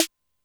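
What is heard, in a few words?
Snare Rap; Alesis D4; drums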